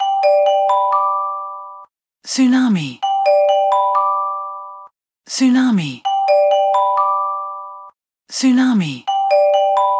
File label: tsunami; etws; Android; CellBroadcastReceiver